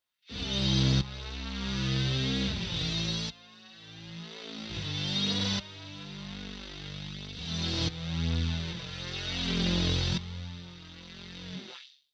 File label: ROLL UP SWEEP